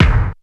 BD DEEPGATE, bass drum; BASSDRUMS

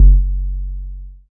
BASS MINIMOO

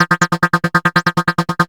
RAVER, LFO DANCE

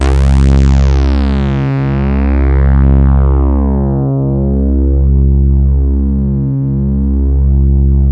C3, SYNTHS; OBMX; SQUEEG

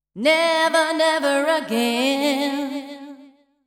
deep house female, 125, A, vocals

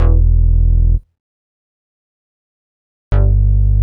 DEEPHOUSE BASS 125 A

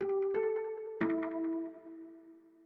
ETHNO 90 D

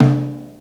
JAZZ TOM, JAZZ DRUMSET

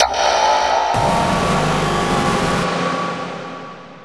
TECHNO FX 140

